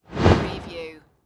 Magic Wand Blast

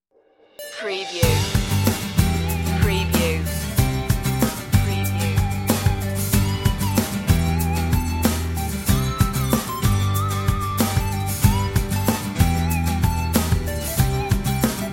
crash synth

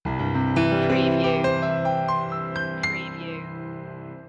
piano loop intro; deck the halls; media music now

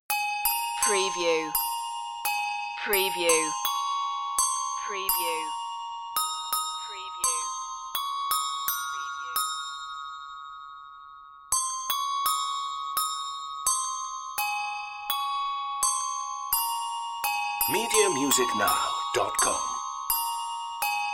handbells; it came upon a midnight clear

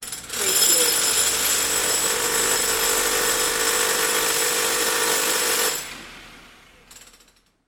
rattling construction tool

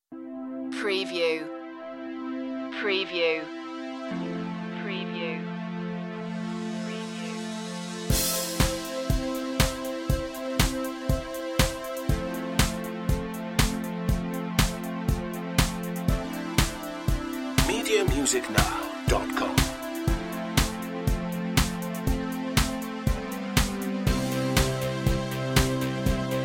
gliding waves underscore

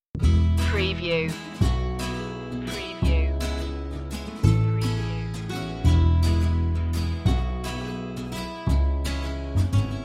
no piano

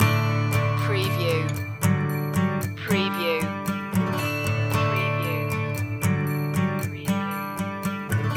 good morning guitar loop

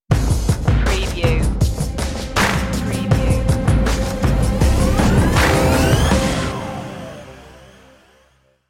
battlelines sting

